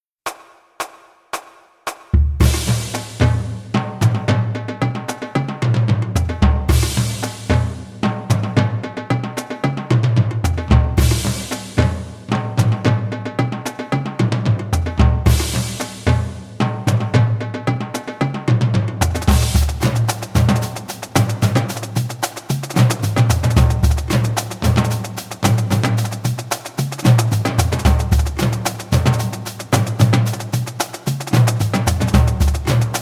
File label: split em up, drumline; south high